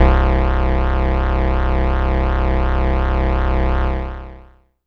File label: MOOG SYN, Synths